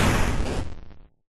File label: death; Minecraft